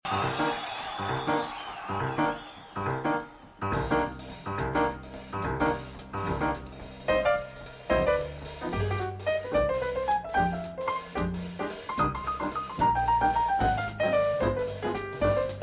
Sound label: Waltz